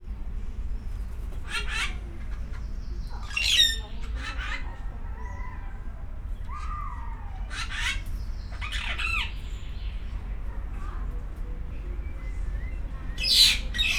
látogatói hangulattal fűszerezve, egyszínű királycsőrics; egyszinu kiraly csoricse; szegedi zoo 2011

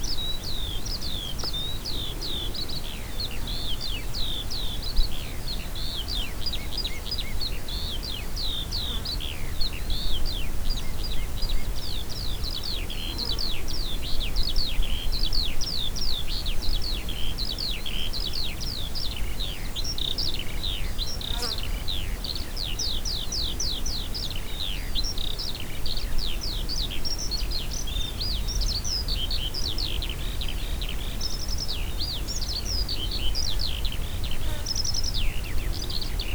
mezei pacsirta; allathangok; termeszetben; felso tisza

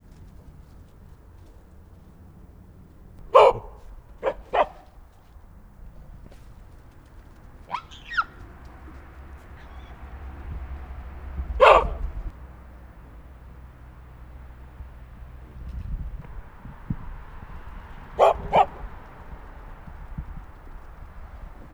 ugatas szelvedo, galleros pavian; állathangok; nyíregyházi zoo 2010

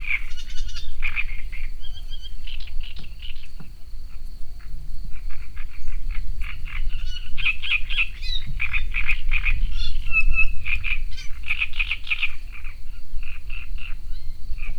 cserregő nádiposzáta is, bölömbika; termeszetben

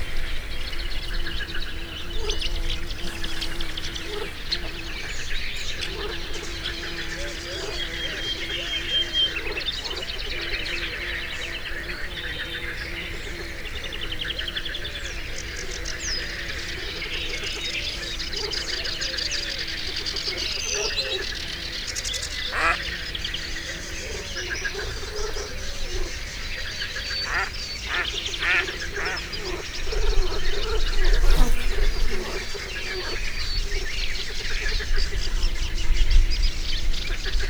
természet, vadkacsa is; belso vegallomas kozeleben; hortobagyi halastavak

